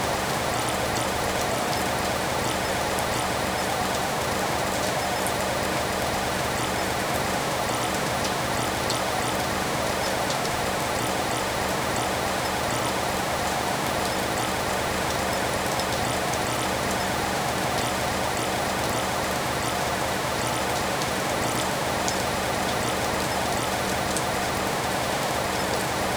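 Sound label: nighttime rain heard through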